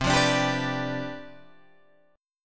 C Major 7th Suspended 2nd Suspended 4th, Guitar Open G, chord